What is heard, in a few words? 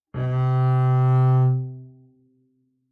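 Bass arco fortissimo sul G C4, Double Bass; MIS Pitches - 2014; Strings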